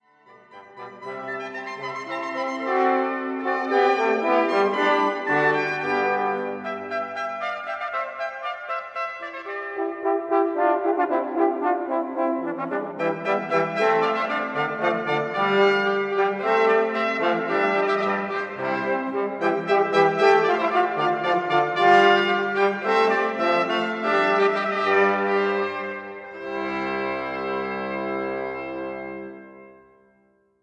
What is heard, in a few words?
Hornpipe, brass; Alla Hornpipe; Wassermusik; Haendel